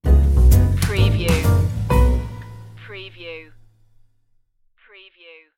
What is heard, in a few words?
swinging life stinger